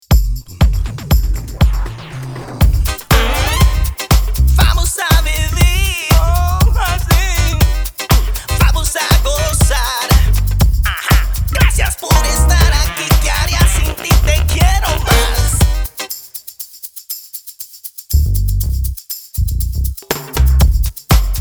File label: FUNKY